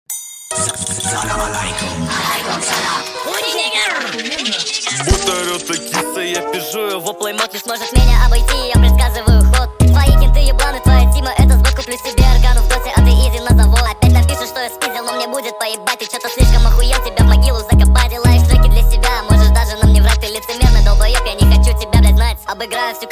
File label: sped up speed up reverb